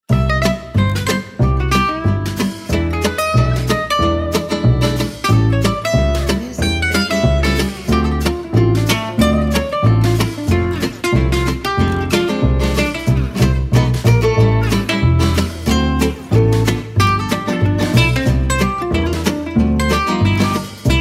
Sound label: Funny Gypsy Jazz